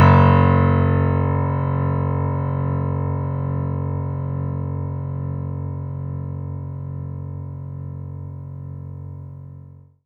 F#1, Synth Line; 90 s Sample CDs; NiceBeats; SynthLine VOL-22

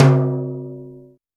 CONG TOM, CONGA BONGO; Best Service ProSamples vol.54; AKAI

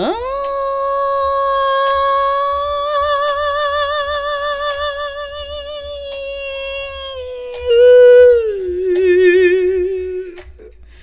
FEMALE, Hip Hop; E-MU Formula 4000 Series Vol. 1; Hip Hop Nation; Phatt Phemmes 3

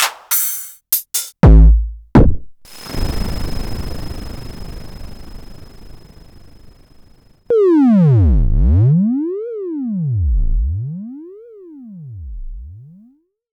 Korg Electribe ER-1 Kit

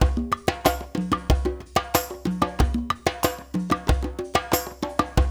percussion loop; InVision Interactive; Percussion Slam; PROGFROG

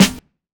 KIT, Dance Mega Drums, HIP; Best Service

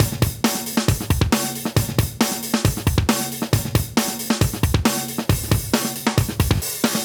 136 live breaks

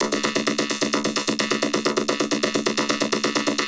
Vocobeat; Sonic Foundry (Sony Creative Software)

